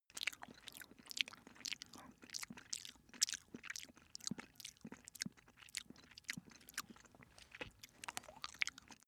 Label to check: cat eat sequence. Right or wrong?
Right